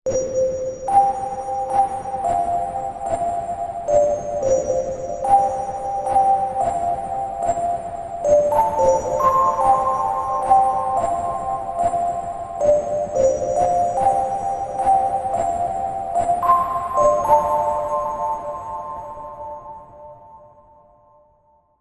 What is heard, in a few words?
bell delta